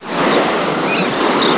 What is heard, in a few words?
seagull